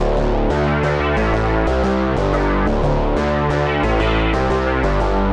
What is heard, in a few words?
Bass loops; Zystrix